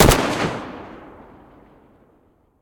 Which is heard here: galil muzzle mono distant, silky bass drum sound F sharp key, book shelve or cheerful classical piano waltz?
galil muzzle mono distant